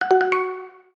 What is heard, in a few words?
Messenger Jump; GPSMAP H1